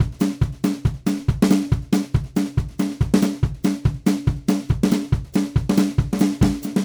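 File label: Country Drums, Verse 2 Section 2; Digidesign; Pro Tools Essential Loops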